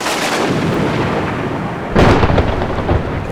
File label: THUNDER; Best Service - XXL Production Effects